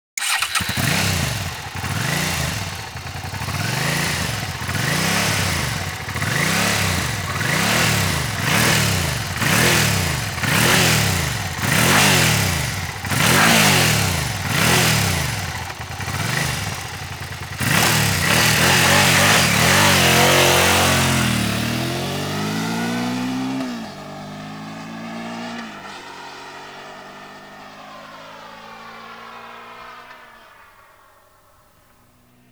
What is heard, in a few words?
Suzuki SV 650 S